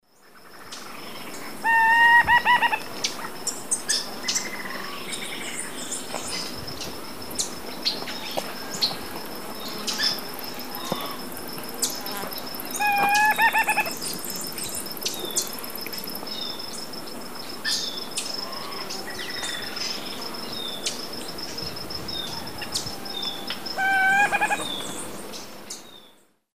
Piaya minuta, calls; S. America; Eastern Lowlands

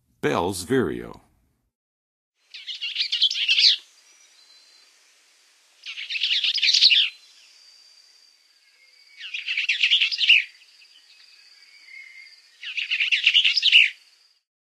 Bells Vireo, Songs of Birds of North America; Western USA; Western USA Songbirds

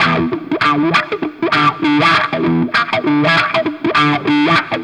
Guitar E min, Rock

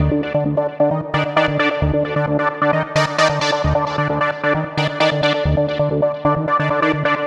Lead Synth

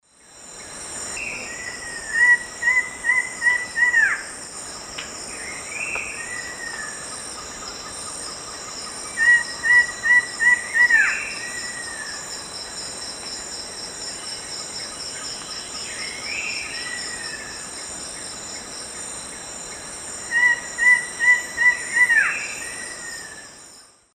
Thamnophilus schistaceus, song; S. America; Eastern Lowlands

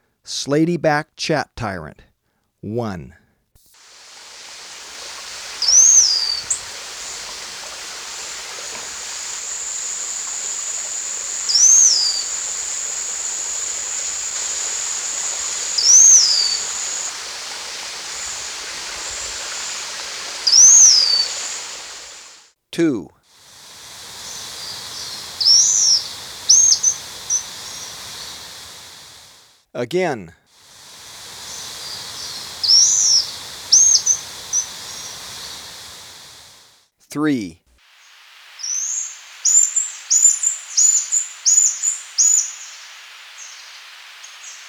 Slaty-Backed Chat-Tyrant, Birds of Ecuador; S. America

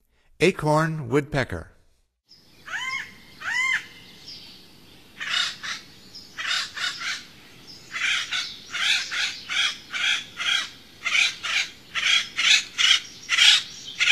Acorn Woodpecker; North America; Stokes

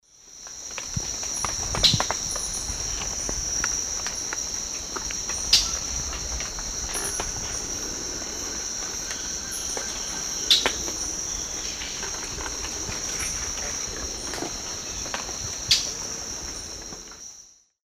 Microcerculus marginatus, calls; S. America; Eastern Lowlands

